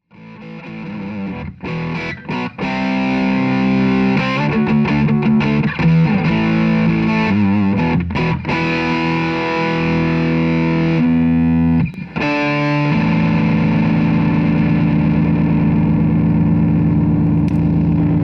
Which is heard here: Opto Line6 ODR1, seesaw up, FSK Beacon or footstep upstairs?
Opto Line6 ODR1